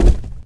cardboard, player footsteps survivor walk; source engine; left 4 dead 2

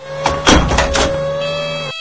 tank ready fire, vehicles; Source Engine; video game

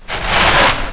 tentacle swing; source engine; hl1; video games

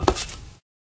black tile, footsteps

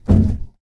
cardboard box impact soft

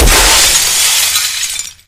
crazy box break, glass

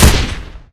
sentry shoot, weapons; tf

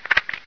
ag idle; agrunt; video games; source engine; bshift

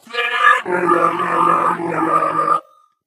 big wave; Portal 2; Source Engine; video games; ballbot

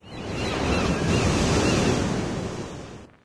nature startup; windows 9x; sound schemes

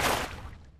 jar single; tf

